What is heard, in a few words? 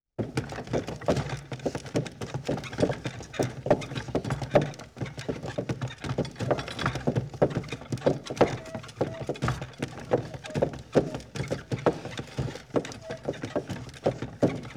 Ox Cart Over Wood; Period Vehicles